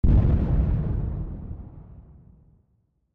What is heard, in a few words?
sound Balloon Blast